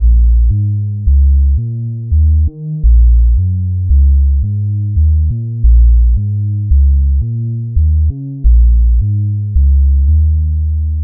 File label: Lone Bass, Drum n Bass; KJ Sawka; Drum n Bass & Beyond